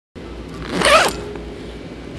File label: Zipper Instrument Case